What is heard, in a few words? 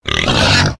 boar Attack